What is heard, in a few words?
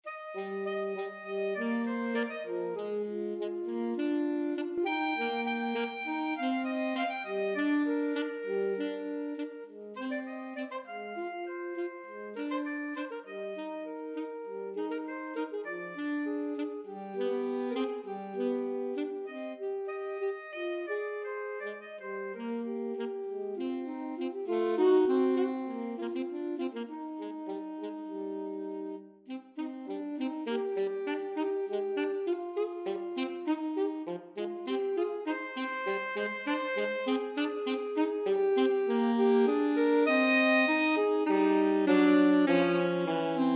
alto saxophone duet